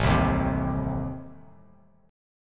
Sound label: A Diminished A down Bass Standard, chord; Adim strummed; JGuitar